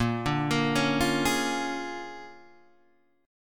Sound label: A sharp 7th Sharp 9th A sharp down Guitar Open G